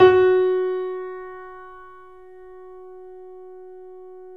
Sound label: F#, Boesendorfer, 3D Pianos; E-MU Producer Series Vol. 5; 3-D Audio Collection